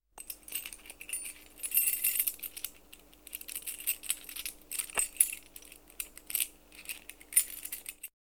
Sleutelbos; KMP Film Video Sound Effects

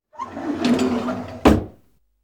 La Dicht; KMP Film Video Sound Effects

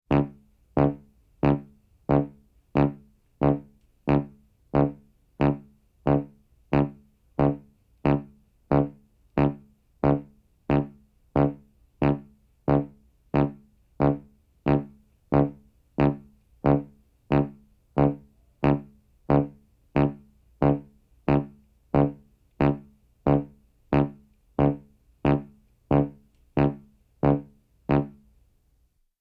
Electronische Tonen Langzaam; KMP Film Video Sound Effects

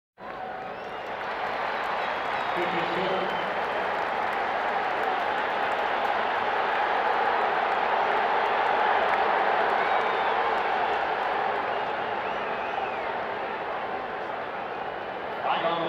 American Football Publiek; KMP Film Video Sound Effects

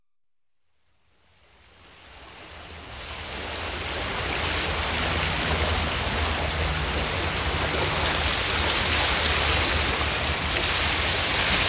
Vollopen Van Een Sluis Openen Sluisdeur; KMP Film Video Sound Effects

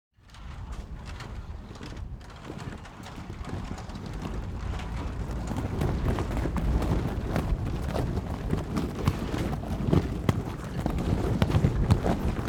Jacht Haalt Zeil Op, watersport natuur; KMP Film Video Sound Effects

